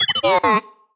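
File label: Sci-Fi Droid Robot Emote Neg; jasem just another shooter with electronic music windows 2017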